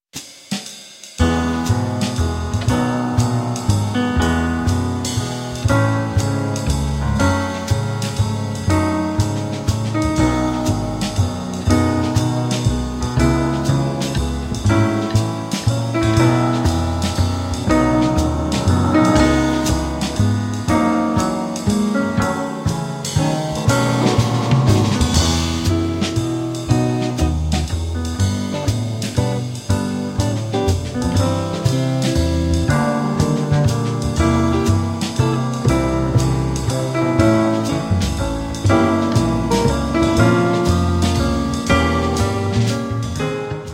In E Major, Jazz Ensemble

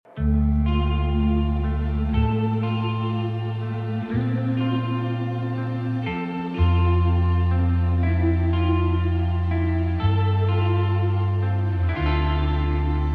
Drone

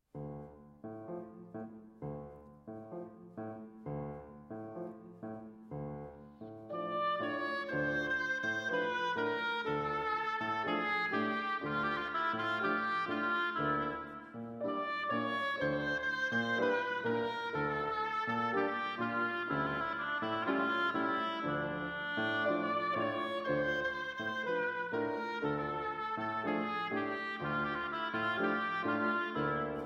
Oboe & Piano, Habanera

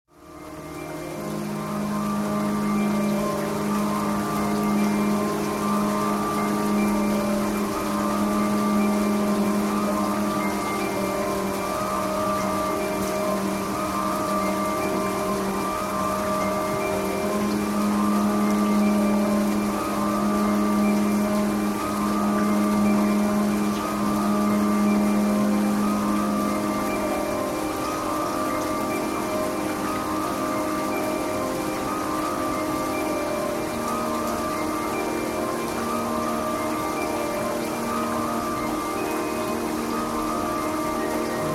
Procedural Piano During a Storm

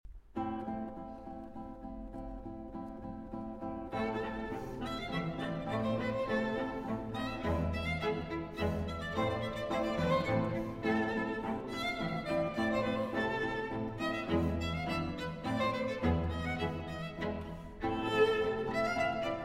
Quartet in A Minor, Minuetto, Andantino; Quartet No. 1; Op. 4 No. 1, MS 28

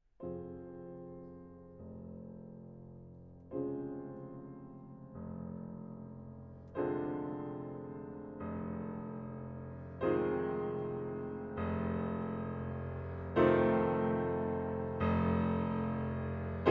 Piano Concerto No. 2 in C Minor, I. Moderato

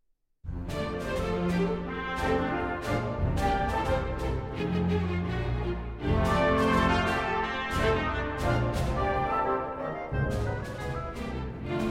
Symphony in D Minor, Juba. Allegro; Price

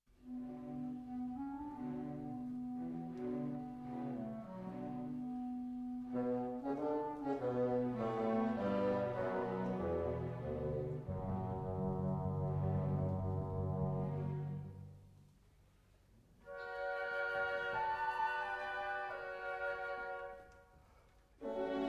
Cello Concerto in B Minor, Allegro

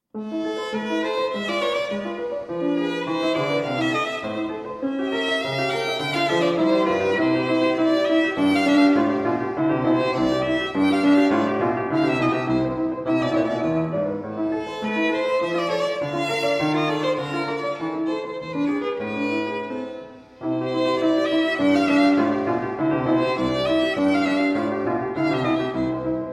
Violin Sonata No. 2 in A Minor, IV. Allegro

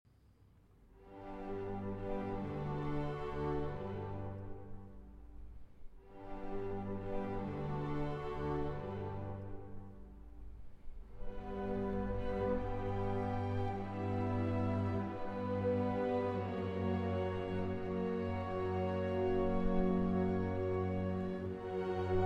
Violin Concerto in D Major II. Larghetto

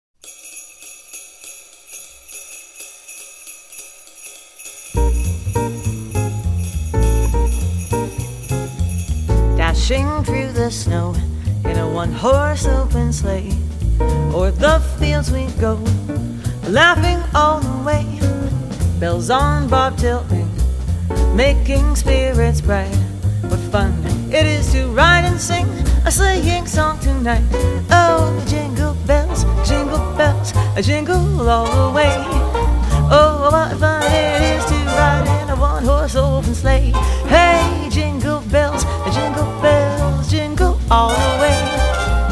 Jazz Orchestra - Jingle Bells

